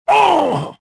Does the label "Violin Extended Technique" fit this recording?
No